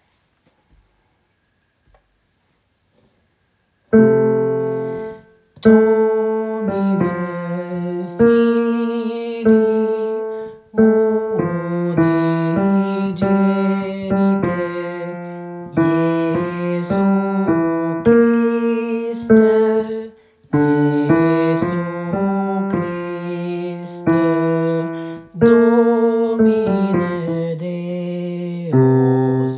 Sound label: bass; Domine fili